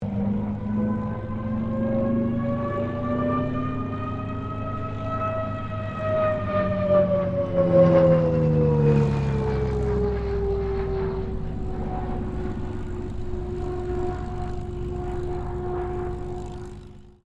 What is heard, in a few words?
AIRCRAFT CA-13 BOOMERANG PASS MUSTANG TAXI STEREO; Airplanes